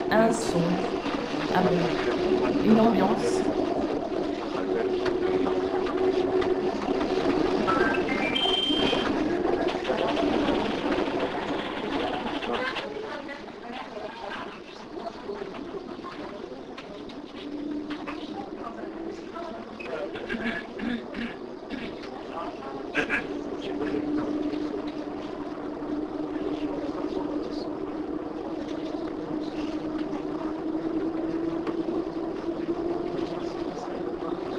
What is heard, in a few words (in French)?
Un son un bruit une ambiance